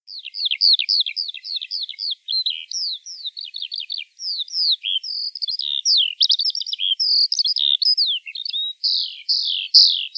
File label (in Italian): allodola, birdsound